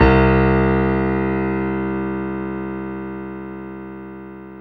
PIA MICRO, MICRO-PIANO

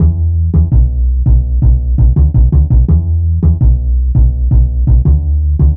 Fat Upright, Bass Lines 166.5; Jungle Warfare 1&2

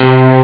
power bass; Amiga Soundtracker Sample Packs

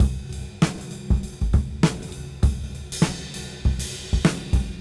Cym Beat 99 BPM Rev; AMG - Keith LeBlanc Phat Butt Jams

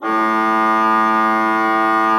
A2, Cathedral Organ; ILIO; Propeller Islands

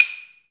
PER Percussion

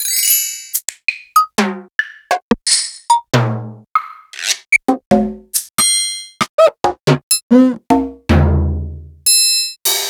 Zoom RT-123

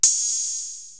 Hi-Hat Open, Drum Machines

